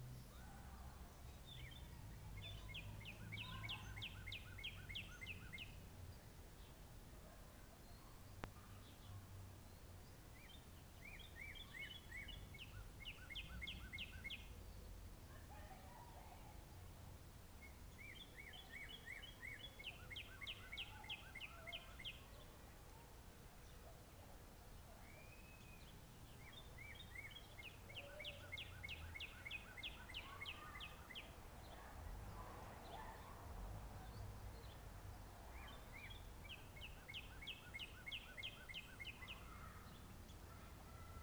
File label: Cardinal